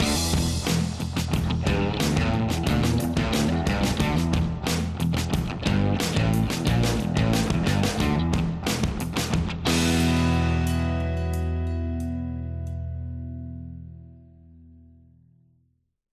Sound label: Generic Presentation